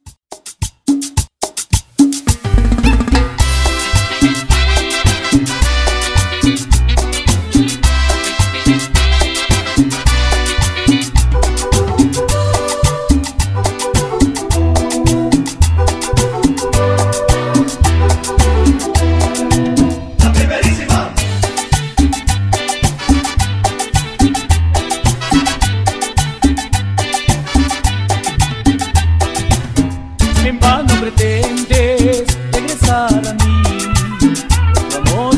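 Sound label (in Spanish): Intro Outro Cumbia Ecuador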